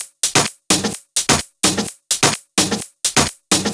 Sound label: Loop, 128 BPM